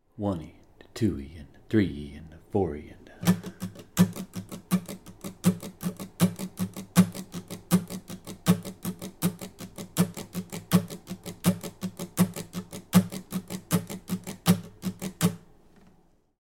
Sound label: strum exercise